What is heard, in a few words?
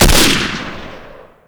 garand shoot; weapons